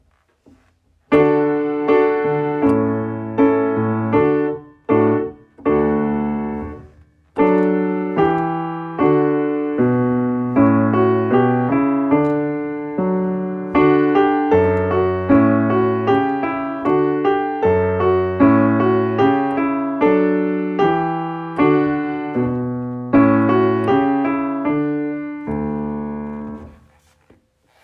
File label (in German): Klavier; Summ summ summ